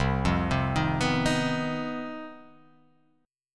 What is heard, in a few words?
C Minor Major 7th Double Flat 5th chord